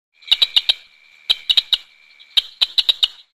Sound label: Frog Cricket Chirps